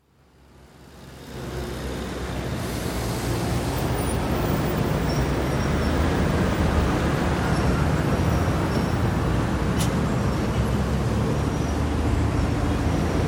Istanbul, traffic at busy road junction, no voices; City; BBC